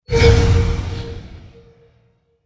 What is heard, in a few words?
Secret discovered; Odium to the Core